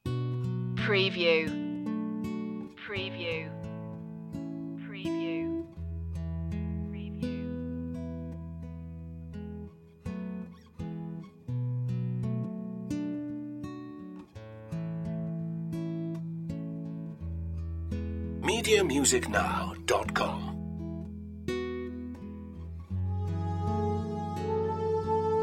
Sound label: gentle sounding underscore